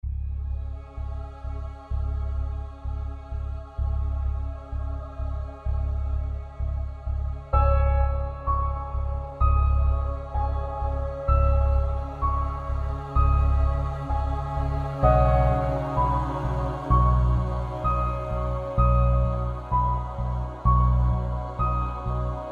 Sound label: Orchestral